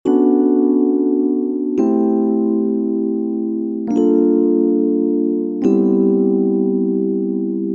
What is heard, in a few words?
Deep Soulful Groove 62 bpm